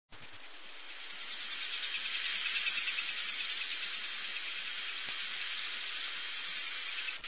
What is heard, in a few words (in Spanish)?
canto sison; aves campo